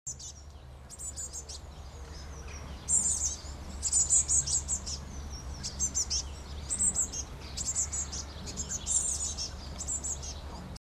Pimpelmees Cyanistes caeruleus Geluid; Annelies van Klinken

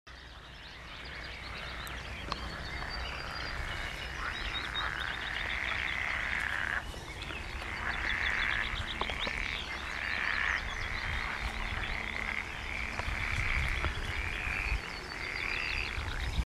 Poelkikker Pelophylax lessonae Geluid; Annelies van Klinken

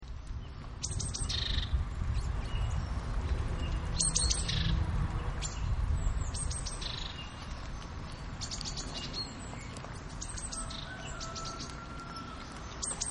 Koolmees Parus major Geluid; Annelies van Klinken

